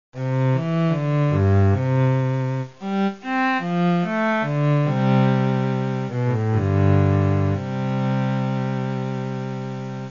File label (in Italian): violonce; primavera